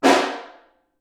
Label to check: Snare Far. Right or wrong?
Right